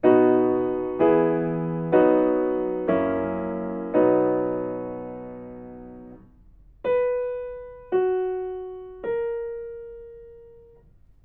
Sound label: melodic fragments; ear training